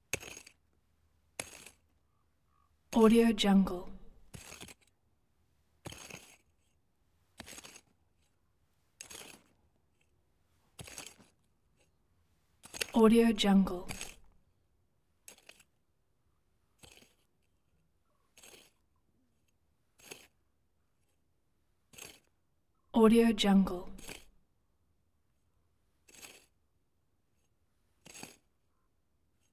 claws metal digging into sand or dirt; prosoundfx

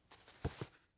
hat take off

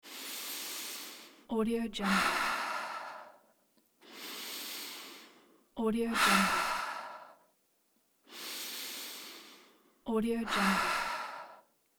female slow deep breathing

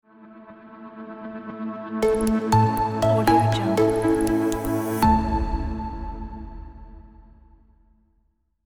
corporate logo

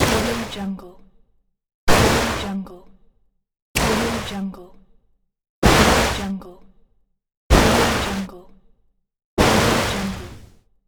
water wave impact

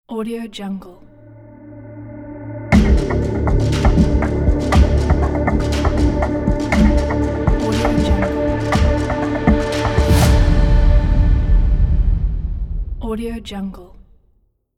tension and release intro logo

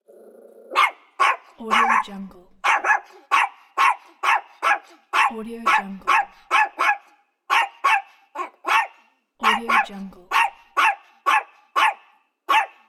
small dog barking

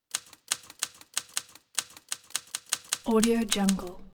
evil typewriter; prosoundfx

